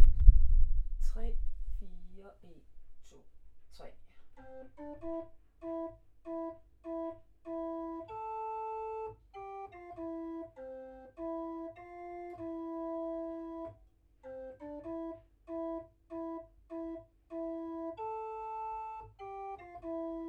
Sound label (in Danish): Mandalay 1 stemme